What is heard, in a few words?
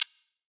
ANALOGUE CLIP, Ultra N Box 404; drum machines